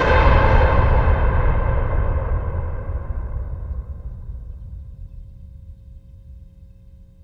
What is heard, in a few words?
electric explosion; Crash Bang Boom